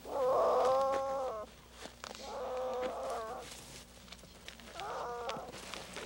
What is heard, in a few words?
bear cub sounds